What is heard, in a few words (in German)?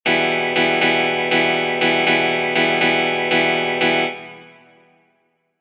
Schlagmuster